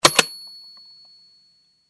coin; Cartoon Network - Toon Cup 2020

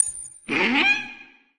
torch dink; Be Cool Scooby-Doo! - It's Dark Out There; ThrillGames

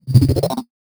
upgrade; Zombies Layer Viking

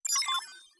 code block added; Ben 10 - Omnicode

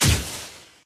appear start; dorothy; run-dorothy

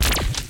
overflow shoot water short; Ben 10 - Hero Time Game; ThrillGames

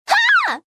Player Hit; Bubbles; The Powerpuff Girls - Mojo Mayhem; NextlevelGames

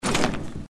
collapsible tread; Danger Mouse - Ultimate

